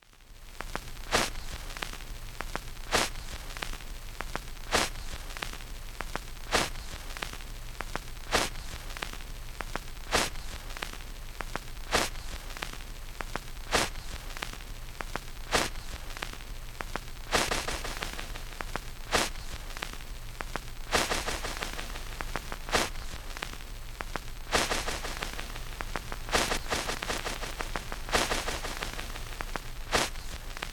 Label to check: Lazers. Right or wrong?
Wrong